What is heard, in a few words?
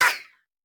Bullet Ricochet; Sound Ideas; Guns